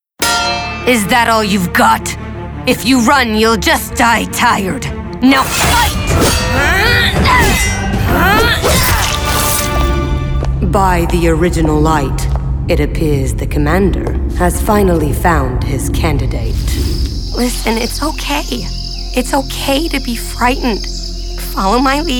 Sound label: Video Game